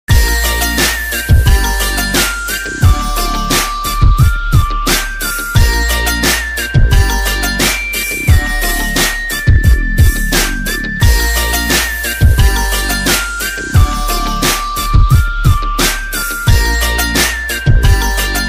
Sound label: instrumental ringtone punjabi